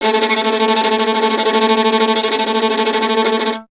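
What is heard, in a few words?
string violin, Vln Trem; Miroslav Vitous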